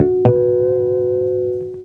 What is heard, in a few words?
Guitar Pick; Zero-G Jungle Warfare 1, 2 & 3; Guitars